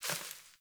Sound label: Sparrow One Wing Flaps; Animals